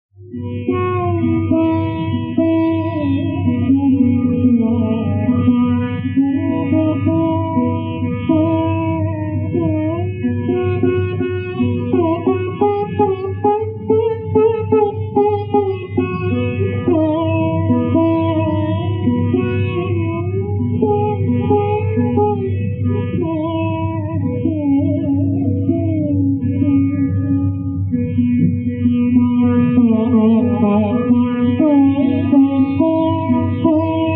sitar gat; nat naraini

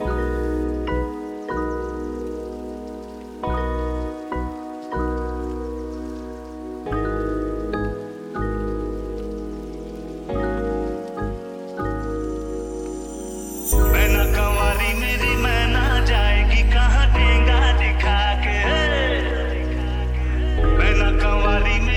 maina kunwari lofi

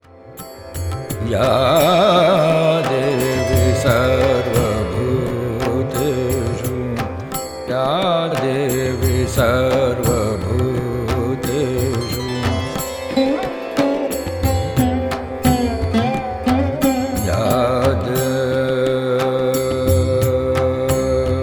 stuti bhajan

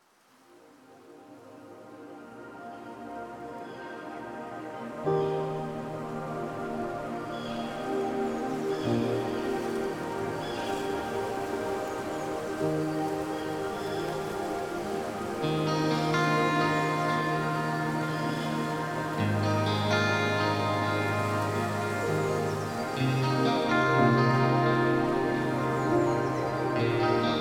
happy mood

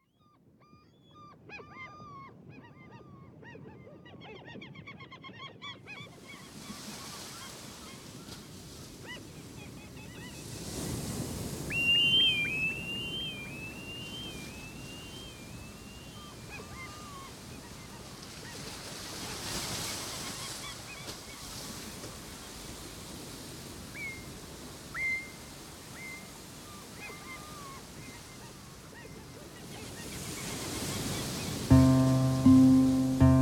sea waves

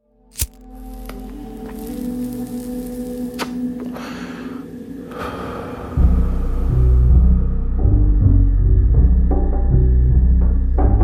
instrumental

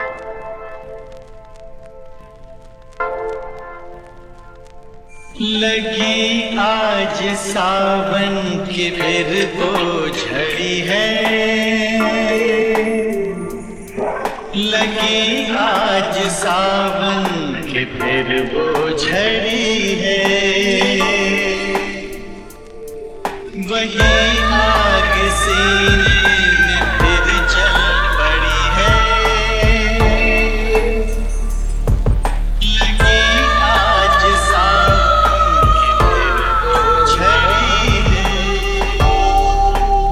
lofi

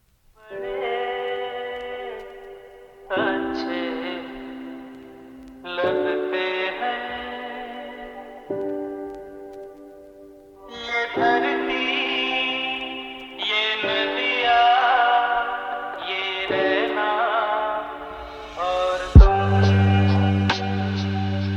lofi

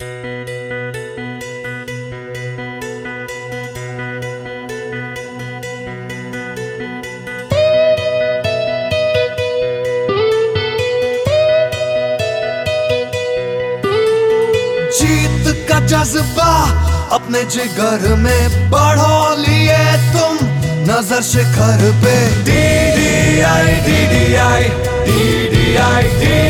anthem song

